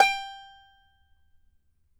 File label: banjo G5, banjo notes, country; Sampleheads; Whole Lotta Country 1 & 2